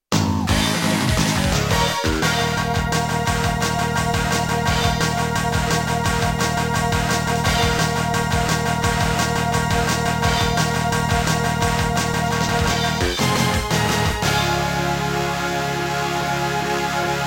Music Intro

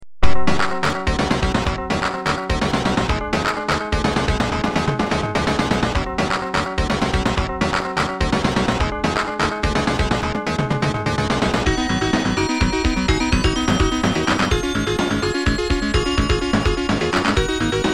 cart music level 7

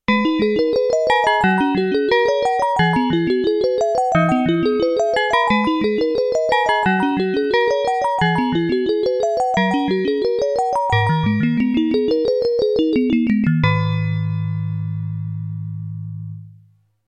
cart music continue

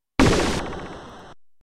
missile

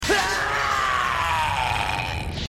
scream; Quan Chi

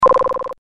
pause, sound made for when the game is paused

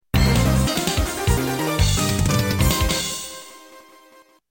music end roll

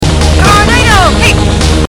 tornado; aof2

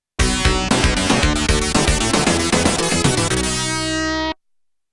music level complete

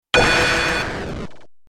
Laser L; Prototype

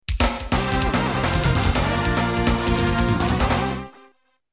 music game over

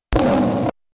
tiger gaoo

